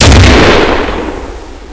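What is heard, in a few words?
pipebomb explosion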